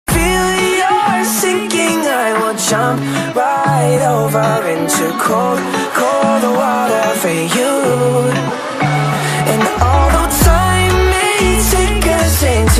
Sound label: english ringtone